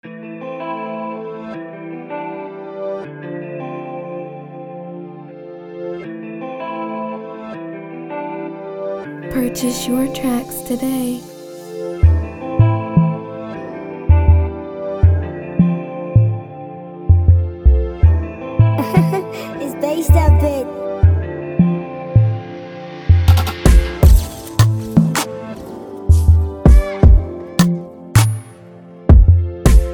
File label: 80bpm